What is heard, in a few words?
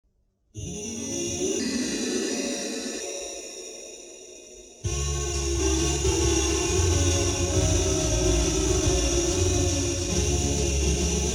hawaiian signal loss; Alien P*rno